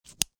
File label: CLASP PLASTIC